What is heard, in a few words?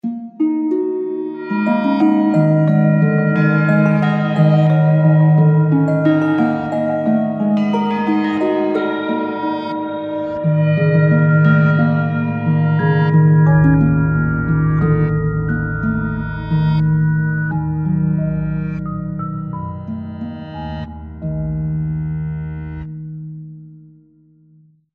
BELLS